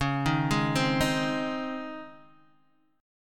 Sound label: Db Major 7th Suspended 2nd Db Guitar DADGAD